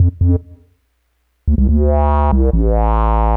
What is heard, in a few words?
bass, 142 Dmin, Hardcore Breakbeat; Big Fish Audio; Hardcore Breakbeat Action; Shake That Booty